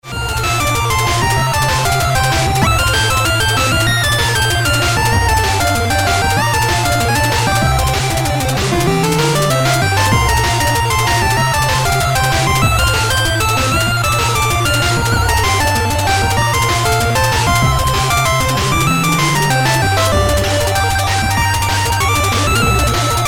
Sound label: Triangle Square Sample